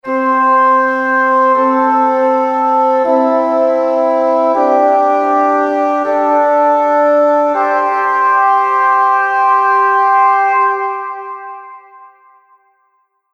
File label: Classical Ident 80Bpm Horns; Musical Sound Effects